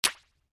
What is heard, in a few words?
brick hitting; bejeweled 2